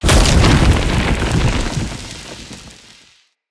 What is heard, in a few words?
Lightning Blast Impact; Characters; Enemy; Abilities; Nimrod